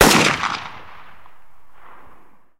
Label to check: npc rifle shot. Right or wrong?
Right